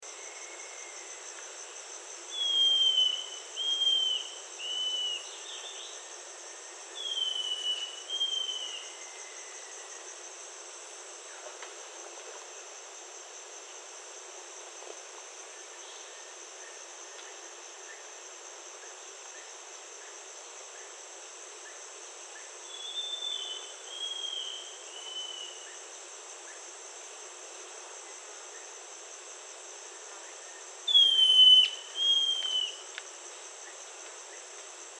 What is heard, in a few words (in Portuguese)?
canto da mae de taoca dourada